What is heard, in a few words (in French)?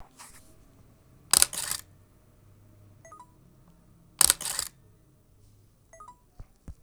son declenchement hasselblad